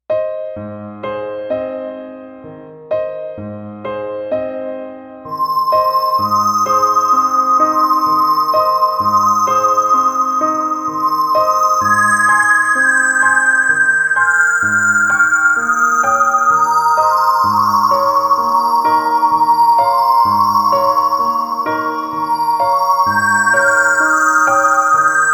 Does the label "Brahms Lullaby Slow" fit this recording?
Yes